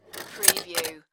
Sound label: Pinball sound, ball slide on metal and close